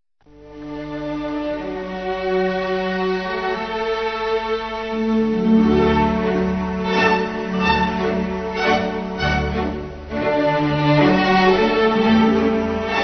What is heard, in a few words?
interval signal; orf rai